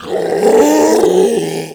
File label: Infested Ancient Strike Scream; Warframe